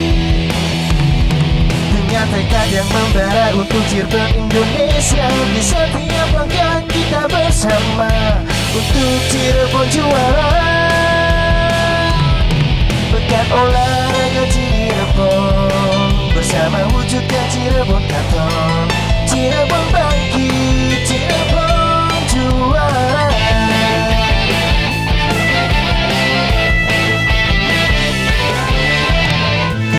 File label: jingle